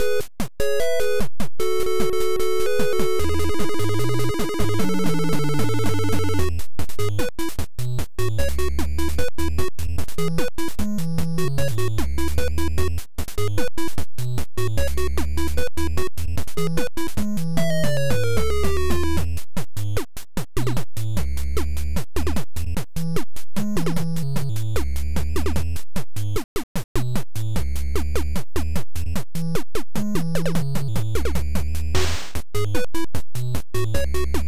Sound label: Balloon Trip, Bonus Stage; Balloon Fight; NES